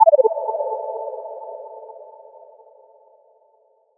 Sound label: Power Down; FX; Cymatics; Light Free Sample Pack; Bonus Samples